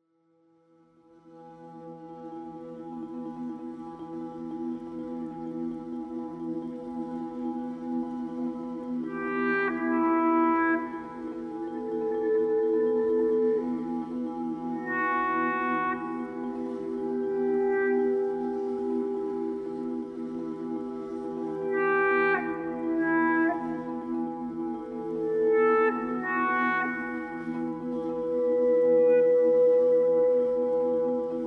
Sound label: Theme Edgy Mood; The Last of Us